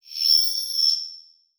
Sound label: Multimedia Internet CD-Rom Flash High Pitch